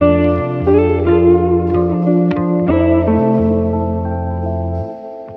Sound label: guitar hit the big one; Loops; Phonky